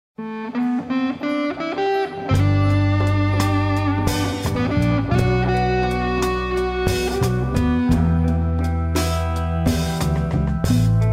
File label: samba